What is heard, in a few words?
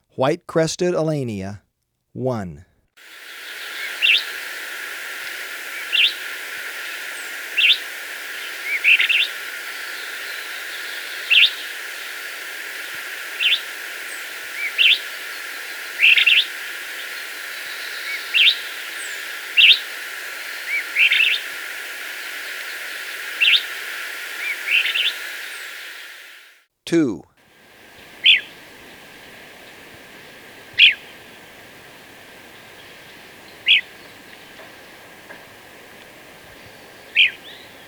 White-Crested Elaenia, Birds of Ecuador; S. America